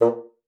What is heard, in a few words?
STAF A#2, BASSOON; Xsample VOL-6